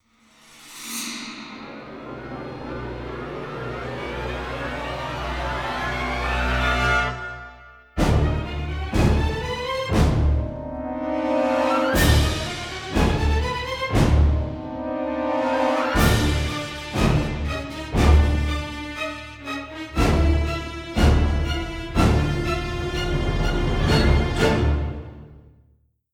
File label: Turboshaft Jumpscare; Star Trek The Video Game; PS3; Xbox 360